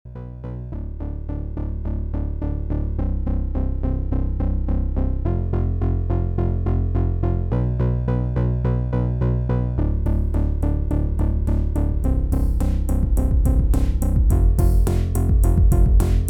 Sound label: synthdrums; weeklybeats-2020